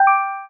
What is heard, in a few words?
pickup